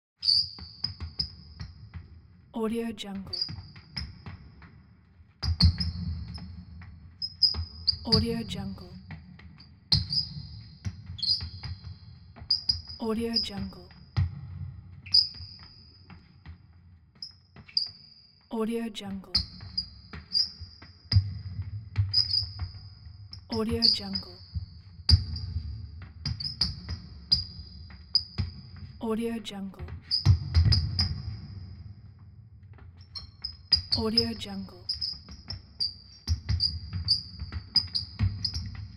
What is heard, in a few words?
basketball game shots squeaks